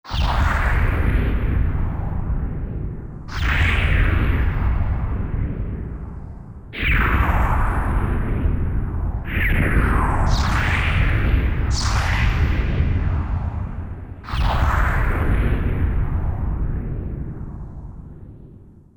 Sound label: Sonic Blast